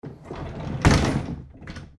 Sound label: door old close